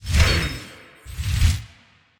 respin plate; druids dream

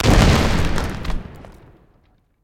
dragon feature end; finn

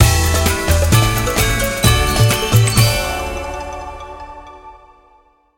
large Win; alohaxmas

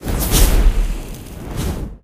wild stack; willyshotchillies

